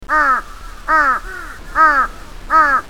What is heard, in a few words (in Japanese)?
hasibutogarasu